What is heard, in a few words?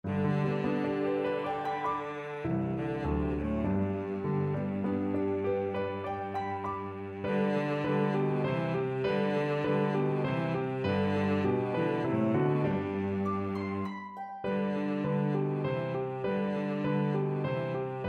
Trio Cello Piano Harp, Trio for Cello, Piano & Harp; The enthusiastic announcement of Christ’s birth to the Shepherds is captured in this Sixth Century Carol.; Come, Shepherds Rise!